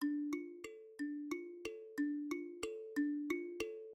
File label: loop